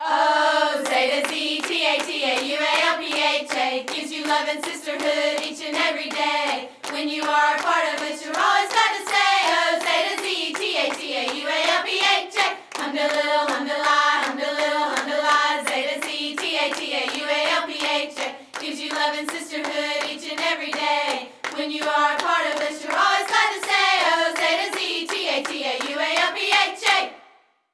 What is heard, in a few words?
supercall